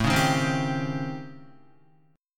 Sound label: A 7th Sharp 9th Flat 5th, Guitar; A7#9b5; strummed; JGuitar